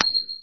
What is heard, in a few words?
Money; 暴力赛车